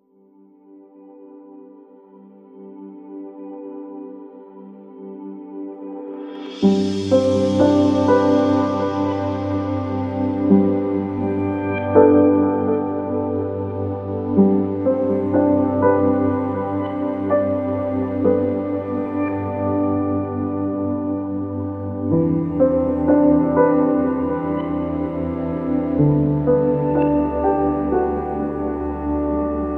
No Pedal Steel Banjo and Arp Synth

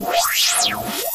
Sharp Noise Transition

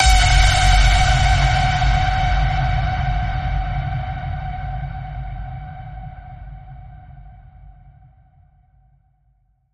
The Final Ping, F sharp

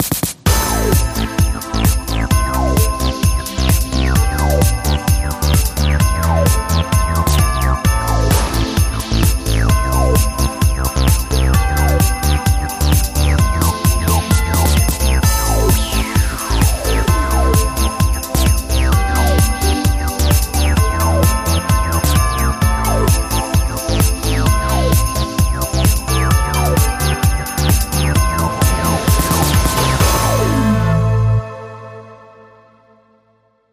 Electro House Mix

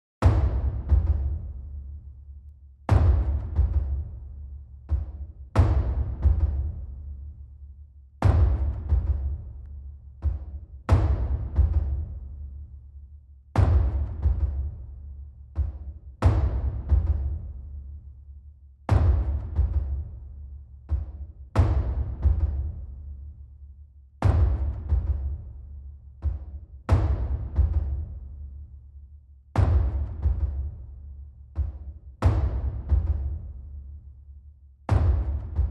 Drums Only

